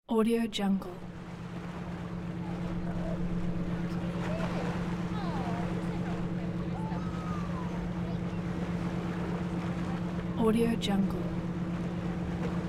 pier ambiance

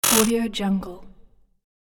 wrong answer buzzer notification

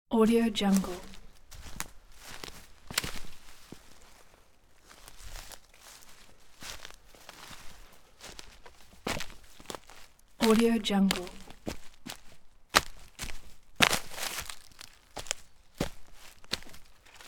footsteps on rotten wood; قدم زدن روی چوب پوسیده; human sounds

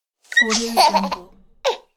baby laughing; human sounds

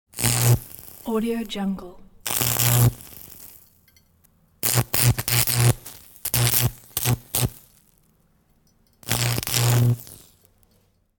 arc welder procuces arcs and sparks; industrial sounds

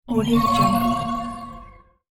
hypnotize spill spell; game sounds; هیپنوتیزم

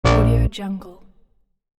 interface denial low grumpy; interface sounds

